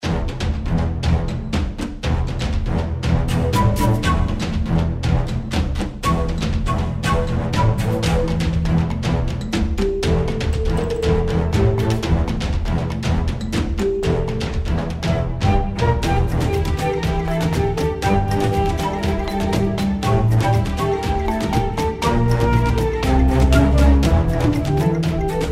Jungle; Justin Danger

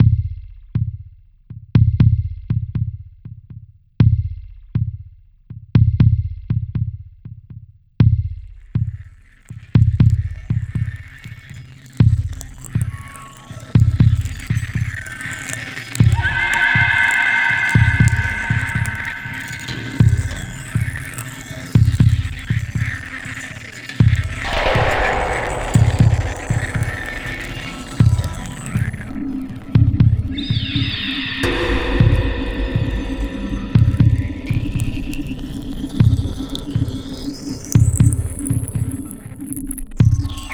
Tense Thumps; Infra

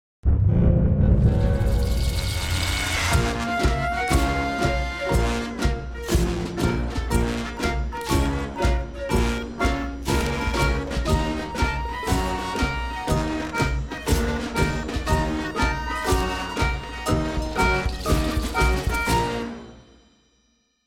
Stinger Extended; Scrap

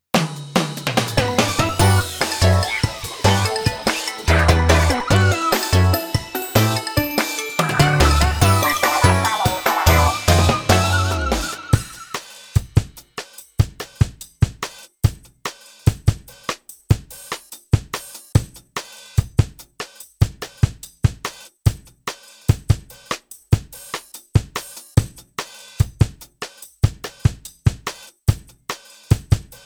Defeat; SpringFest, Phase 2

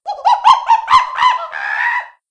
clobber monkey; tiki kart 3d ios gamerip

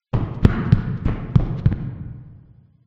run; CHica; Five Nights at Ronald's

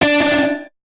Guitar Note; curse crackers for whom the belle toils switch windows gamerip 2022

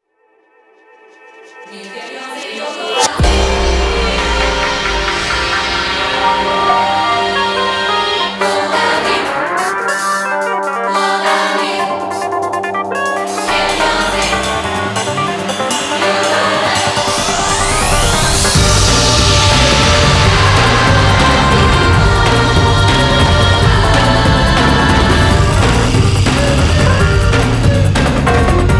pouring rain; master detective archives rain code original soundtrack 2023

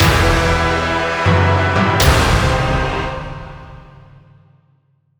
Game Over Dark; Citadels

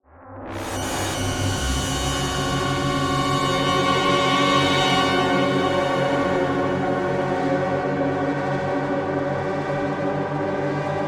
almostfinale oneshot 72bpm

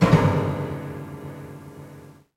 Shock

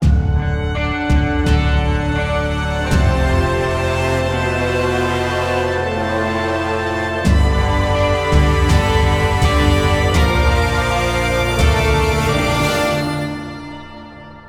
Sting Long; Bakugan: Champions of Vestroia

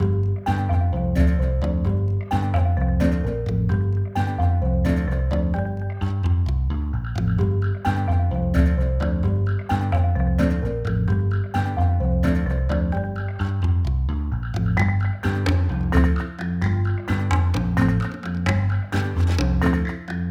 LEVEL FOREST Playtime LOOP

